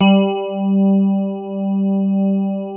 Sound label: DIGI RHODES; Sound Gallery - Charged